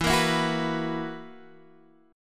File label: B Major 7th Suspended 4th, guitar chord